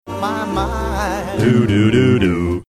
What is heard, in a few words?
singing along doo wop song